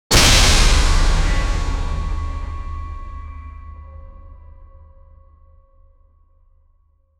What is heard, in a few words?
clean weapon parry sharp, combat